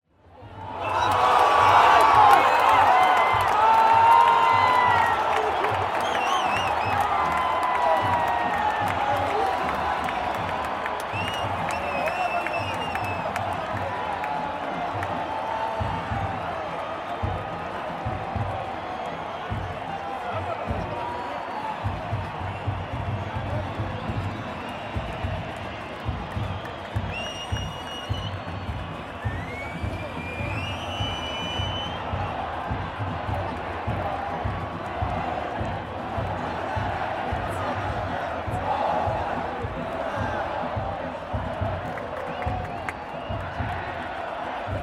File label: Mexico City Football Stadium Parking Lot Match Underway Crowd Cheering; MAD ROBOT Sound Lab